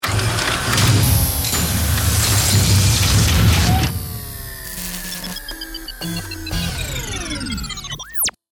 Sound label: Mechanical Electric, Digital, Power, Up, Morph, Metallic, Slams, Transforming, Robot; Electrical; Power, Down; Production Element, Imaging Element, Accent, Transition; BlastWave